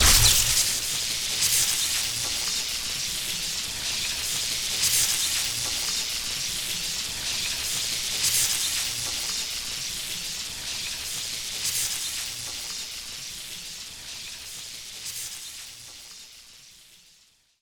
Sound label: Plasma Loop